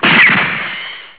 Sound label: gun; e-gun01